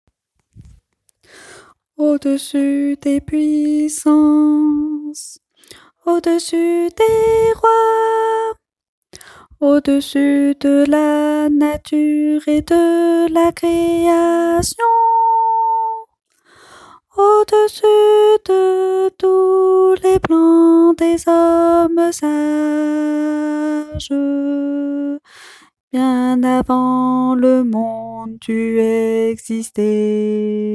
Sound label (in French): basse